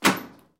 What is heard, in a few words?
Dryer Door Closing